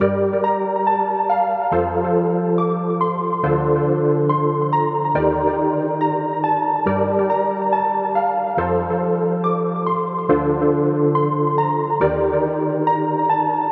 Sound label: 140 BPM G Min Keys; Cymatics; Gems Free Teaser Pack; Cymatics Gems Vol 3; Homecoming